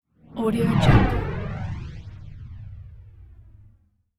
passing space whoosh; hollywoodedge

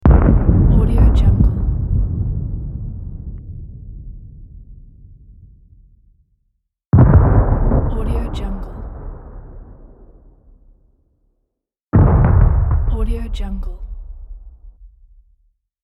distant explosions

